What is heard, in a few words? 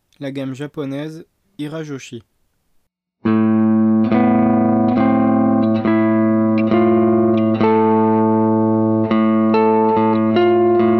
single String